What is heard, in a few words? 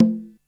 Conga Low, Drums, Roland MT32